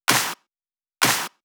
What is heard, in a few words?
Clap snare, 128 BPM; Rap; Vengeance Samples; Vocal-Hook Kits; Song Kit; Let's Move